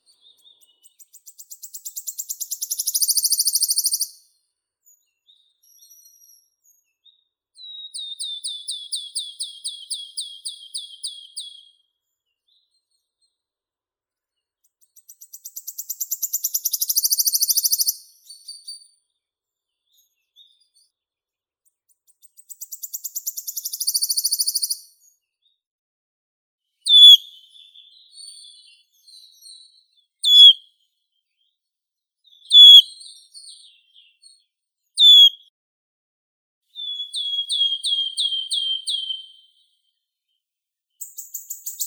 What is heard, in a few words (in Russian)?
penochka treshotka phylloscopus sibilatrix